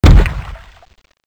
zombi banshee confusion explosion; cso

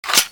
g11 clip out

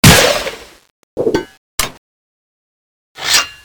scythe shoot; thanatos7; weapons

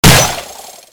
thanatos shoot; weapons

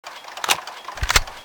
smg draw; weapons

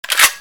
m2 foley, weapons

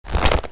draw, weapons; balrog9

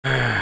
human breath male; cso

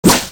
resident stab miss; cso

